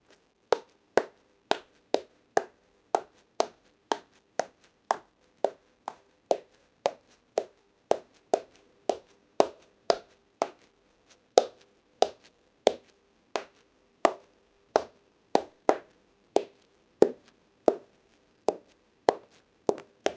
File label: woman goes beat up really, fight